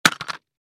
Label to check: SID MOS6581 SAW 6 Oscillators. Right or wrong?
Wrong